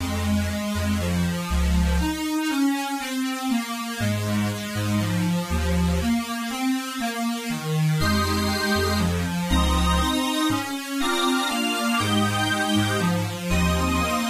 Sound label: icyadventure loopable